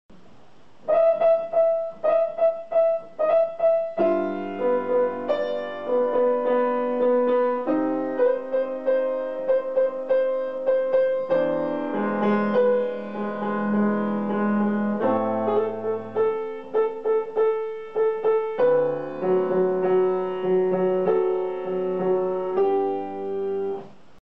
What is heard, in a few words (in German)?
Angefangenes Adagio fur Klavier; Seite 116 des Skizzenbuches vom Jahre 1803